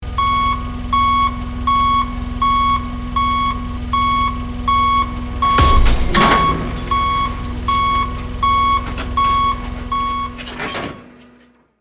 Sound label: Forklift reverse and cargo drop; construction vehicles